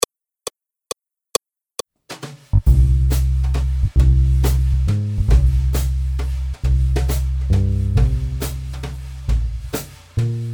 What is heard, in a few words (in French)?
Accompagnement seul; Jazz